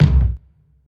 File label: tight low end kick drum one shot C key, bass drum single shot